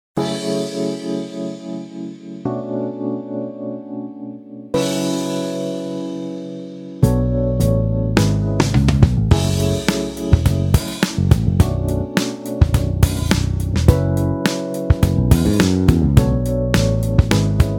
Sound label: drum crash cymbal